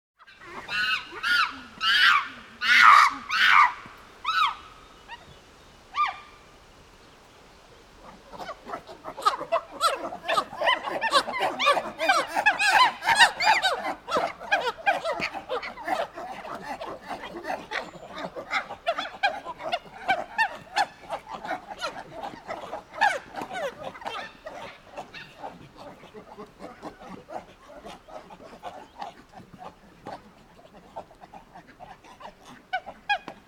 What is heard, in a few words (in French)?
Singes, Animaux; bruitage